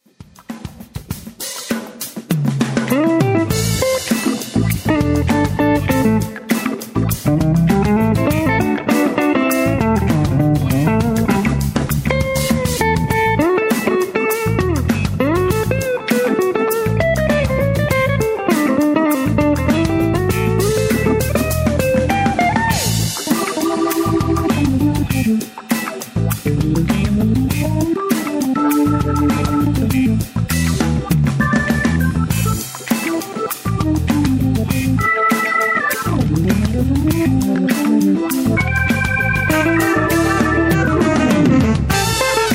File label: Funk Haus